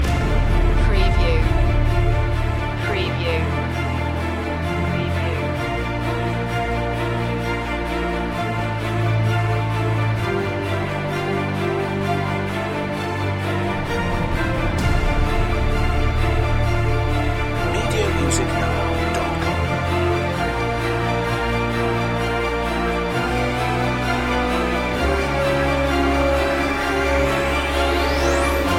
hybrid orchestra

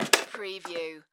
Folding Chair Wood

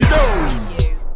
Turntable Scratching Stop Brake

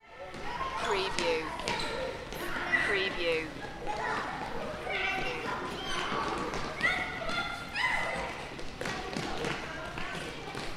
Crowd, Children Indoor Sports Hall

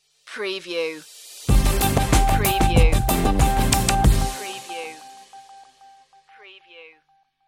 incoming transmission stinger

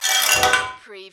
Button Sound - Metal Plate Drag

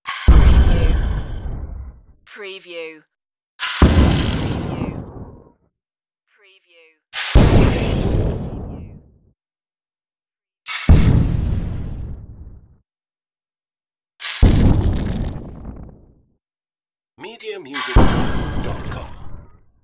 spaceship core implosions

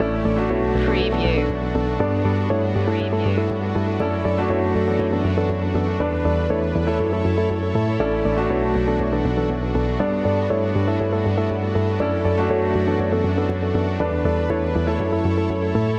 a perfect pitch loop

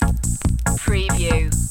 short vintage dance cue

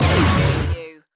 Hit Stab

